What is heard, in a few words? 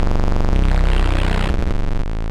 glitch; Zenit